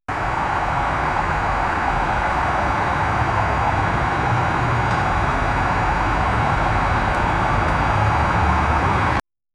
running parking garage, echoing

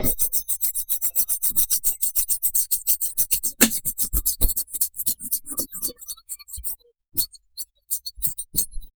some little tiny worker; animated

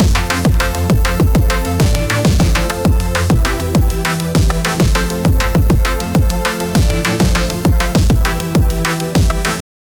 dhol dance; sound effect

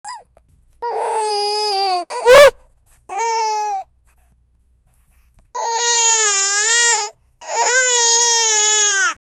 baby puppy crying sounds

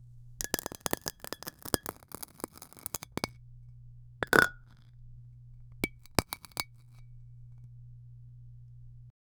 close up asmr sound, aluminum foil

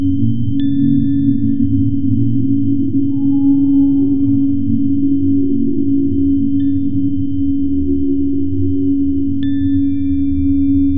futuristic sci fi ambiance

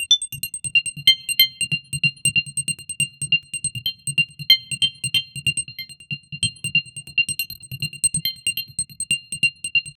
clean modern 3 5s ringtone

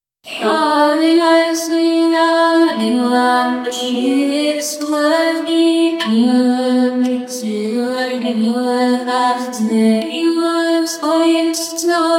celtic viking intro to a, music

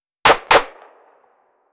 rifle gunshot single fire

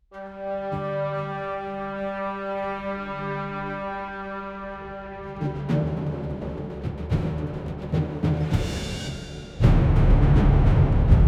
create an epic military o, epic

